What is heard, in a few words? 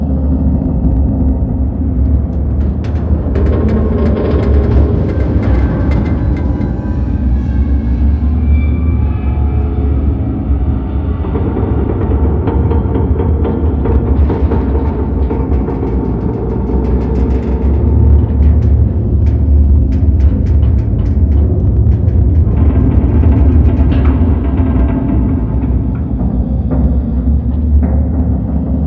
ambient